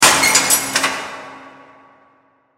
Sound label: Mechanical Assembly, Mechanical, Assembly, Joint, Connect, Metallic